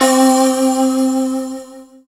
SYN ASIAN, Roland; Sound & Vision - Gigapack I CD 2

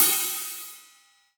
Acousti Hat Hit, hihat; ultimate hihat; Hits

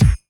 BD, Dance Mega Drums, HIP

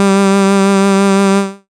syn g, Dance Synths, Acid Bubble; ProSamples vol.37; Best Service

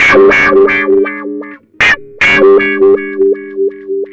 160 WAH5; Jazzistic

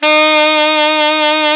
ALT SAX, D3; Best Service; Alpine Volksmusik

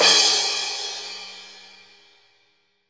LIGHT, ROCK CRASH

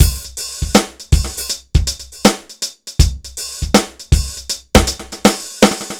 dry Loop BPM 80 Dry, AKAI S6000

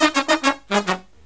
Brass Riffs

